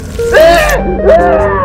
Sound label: eughh eaauhgh